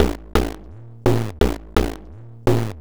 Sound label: Disto Talking Drum, processed; Sonic Foundry (Sony Creative Software)